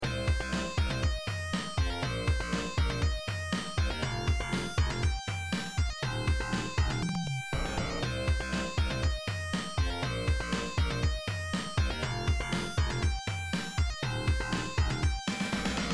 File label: bass basstone lead sound beat